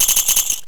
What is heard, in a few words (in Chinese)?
xiazhu add gold; slotsfruitex